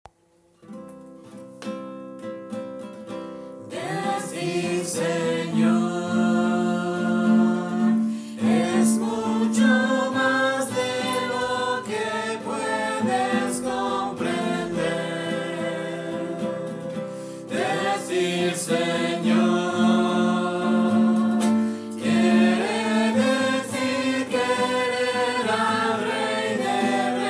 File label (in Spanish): Decir Señor guitarra